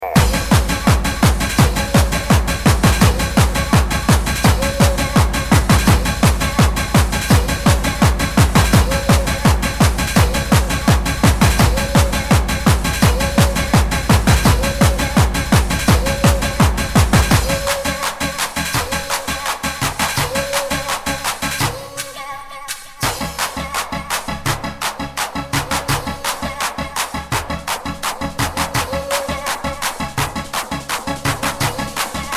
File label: hardcore gabber